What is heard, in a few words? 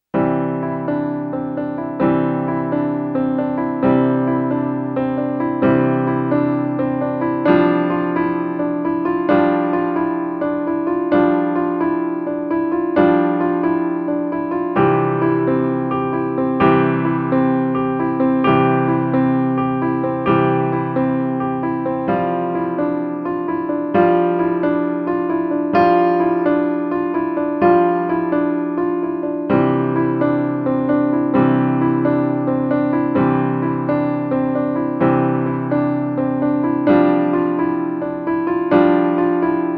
solo piano